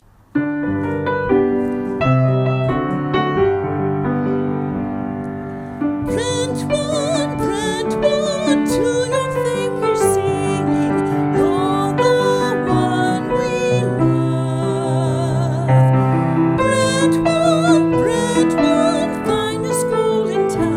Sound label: brentwood school song w voice